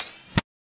LOOP, 160 BPM; Ueberschall; Drum & Bass Flash Trackz

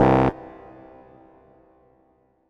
Dance Mega Synth; Best Service; 90 s Sample CDs

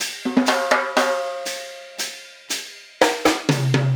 Trap Kit, Drums

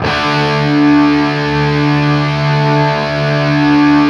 5TH D looped, Guitar; East West; Steve Stevens Guitar Samples Collection

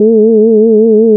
LEAD, Nord; Wizoo - Powered Nord; LEAD PAD HRN

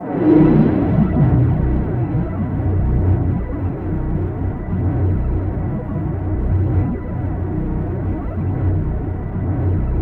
SUB WAVE, Special FX Twisted

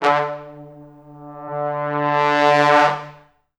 D2, Pop Brass; Best Service ProSamples vol.19; AKAI

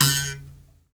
Mbira; Roland LCDP11 Africa VOL-1; M'biras; Bs Mbira 2

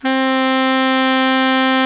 baritone mf C 3, saxophones; Greytsounds - Philip Wolfe David Crozier's Saxophones

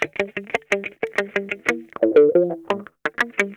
CRO MAGNON, Funk Guitar; Zero G - Funk Guitar

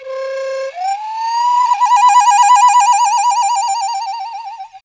CHINA, CHINESE SET; Spectrasonics; Heart of Asia

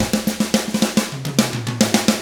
110 SMBA samba; ILIO; Double Platinum Drums 1

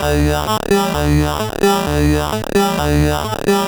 MiniBrute Loop, 130BPM

